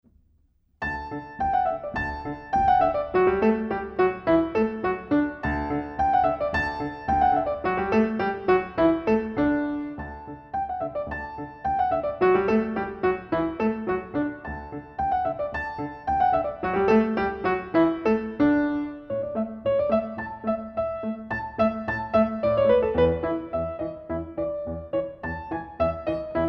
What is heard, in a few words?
Musette in D, piano; Notenbüchlein für Anna Magdalena Bach